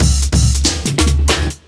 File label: Drum, Breakbeat